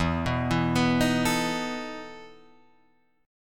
E Major 7th Suspended 4th, Guitar, Drop D

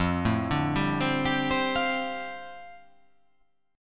F 6th Add 9th F down, chord

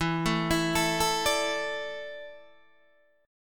E 7th Suspended 4th, Guitar, DADGAD

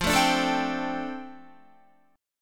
F 6th Add 9th F down Guitar, chord

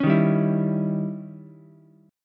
D sharp 7th Flat 9th, Ukulele, C6; strummed; D#7b9; 6,3,3,7; JGuitar

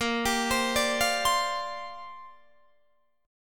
A sharp 6th Add 9th, Guitar Open D

